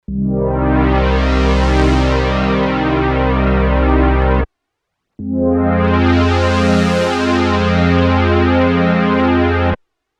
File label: Roland JX03 Filter Flow